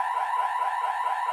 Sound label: Car alarm; Ambient; zps; Alarms